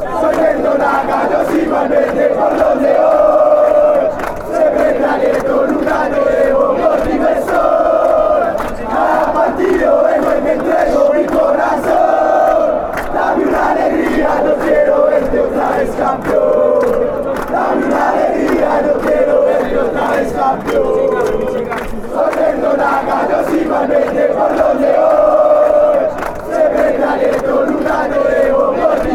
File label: chant; atlctico nacional